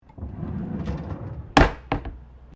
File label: Drawer Interior Wooden Kitchen Large Saucepans Close; Various domestic drawers; Household